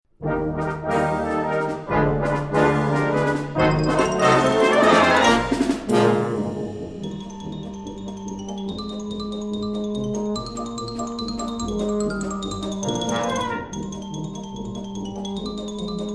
crazy drummer

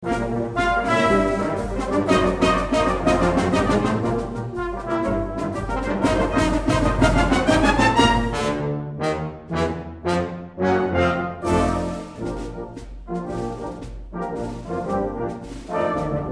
jangle brass

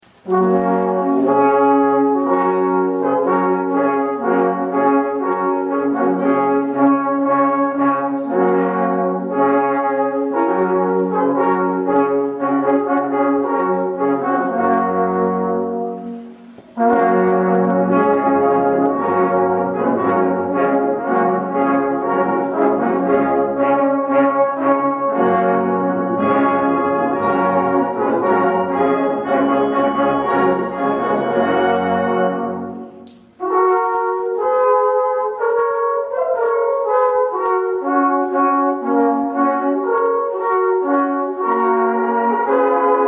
trumpet voluntary cor des alpes

